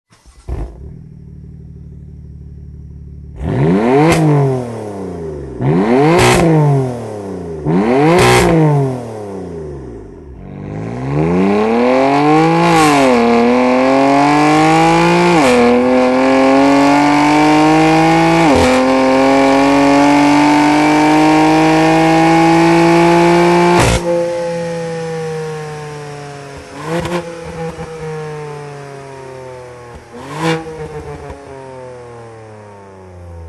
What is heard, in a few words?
Link Pipe set BMW M4